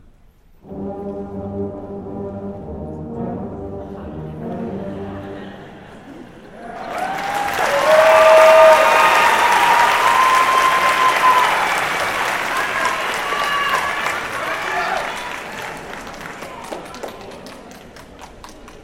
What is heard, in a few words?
Euphonium Tuba Feature, Beginner Winter Concert; Knight Band